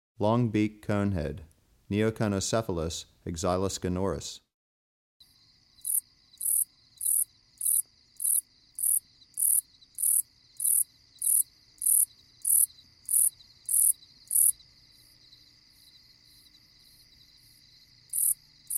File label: Longbeaked Conehead Katydid, Neoconocephalus exiliscanorus; Crickets and Katydids